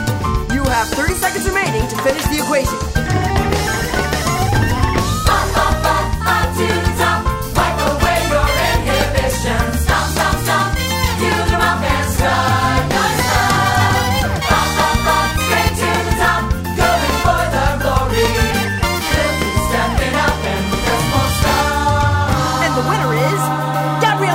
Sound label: Vocal